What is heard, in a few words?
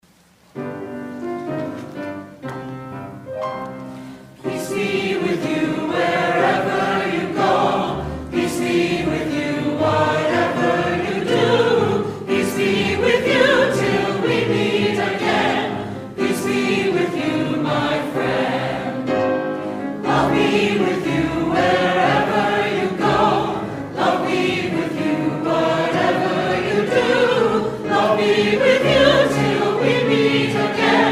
Peace Be with You, choir